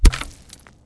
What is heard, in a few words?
grass; Mafie 1